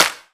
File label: Claps & Snares